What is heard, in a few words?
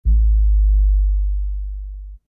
bass